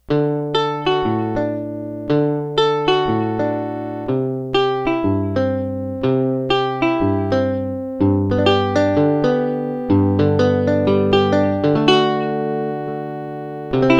dobro

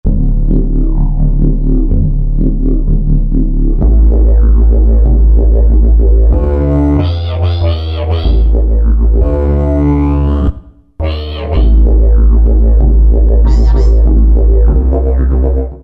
Didgeridoo; World; Roland SR-JV80-05

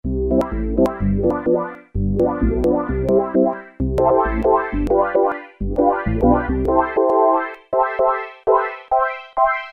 Wah Release, Roland Juno-106; Factory Preset

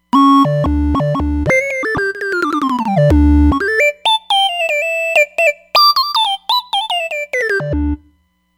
TriStac Lead, Roland Fantom XR

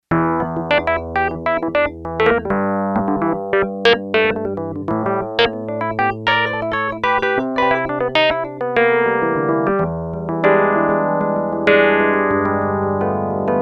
Yamaha TX802; Factory Patches; RubbaRoad; Voices

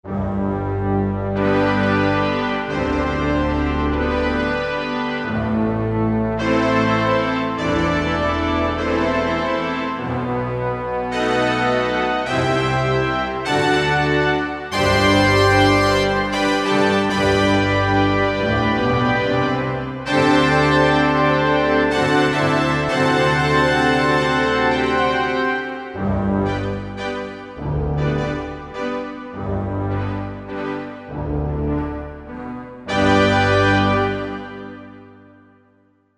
Brass Orch, Korg MSC-11S Brass

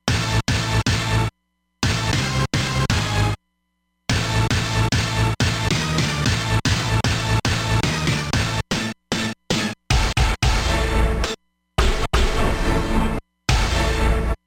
Good Old Hit

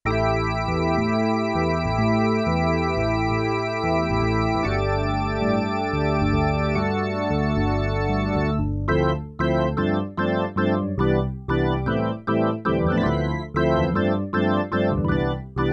E Organ, Yamaha TX16W; Combo Performance 1

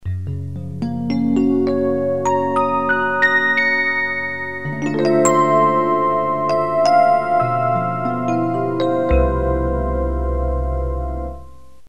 El. Piano; Generalmusic pRP8; Preset demos

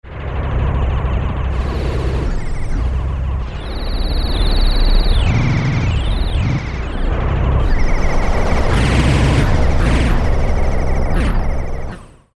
Rotor Craft, Roland U-220; Factory Patches

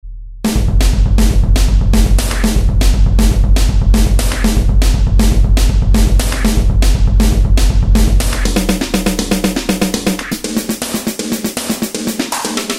Bass Drums & Snares, Korg TR-Rack

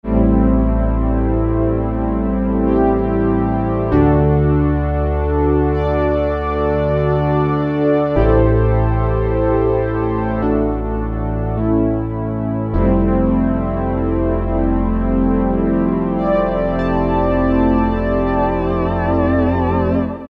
Strings, DWGS synthesizer presets; Korg DSS-1; Korg DSS-1 Sound Library

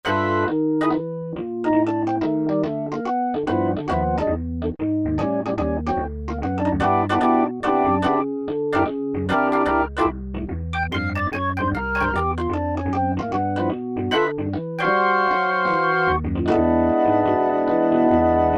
Click Organ, Korg MSC-8S Percussion

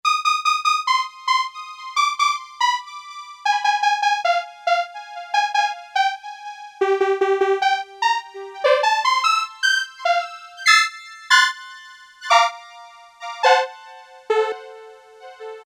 ECHO BRASS, Casio Rapman; Tones

